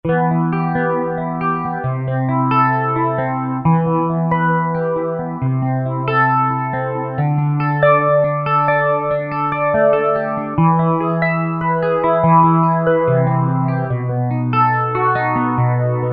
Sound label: Phase Guitar